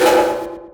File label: perc, Digital FX; TRUTH-HZ; Crowdsourced Sample Pack